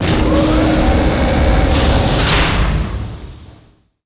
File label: droid tray lift motor; droids robots